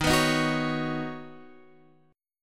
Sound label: Eb Minor 7th Sharp 5th Eb Guitar Standard; strummed